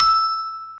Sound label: Bell E6